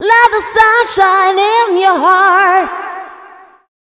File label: FEMALE, Dance Vocals